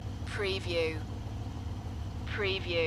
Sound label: Chevy V8 Hot Rod Engine Loop Idle